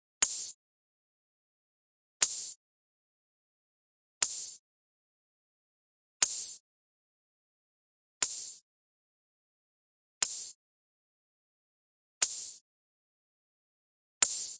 120 Ride, 120BPM; Breathing Circle

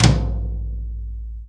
TT hi Brush kit; STYLE KITS (Hip Hop, Etc)

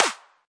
prophet Clap, Prophet with Processing; Hip Hop; STYLE KITS (Hip Hop, Etc)

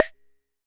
Jomox MBrane drum machine; Jomox MBrane 11

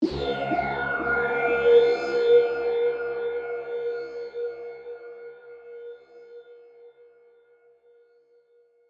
SH101 Tone (FX); SH101 Tones; SYNTH KEYS

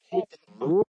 hold, glitches growls words and tones; Ze Franks Pain Pack

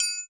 triangle; Triangle Kit; ETHNIC and WORLD PERCUSSION

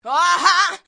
diva wha haah

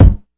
lofi kick

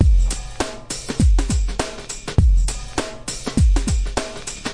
101 compr swinger, drum loops and breaks; 101 to 110 bpm

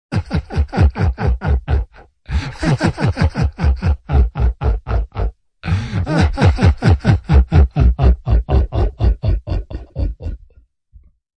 evil pitch shifted laugh

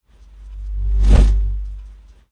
big back, Kicks; single hits

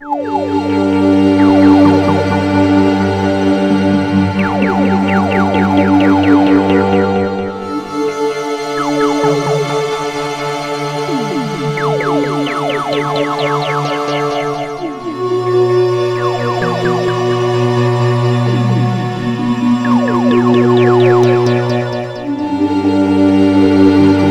space strings

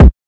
Kick Drum; Khezie Hip Hop Kit

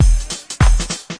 100 bpm house, drum loops and breaks; 091 to 100 bpm

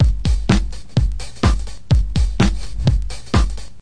too stretched breakbeat

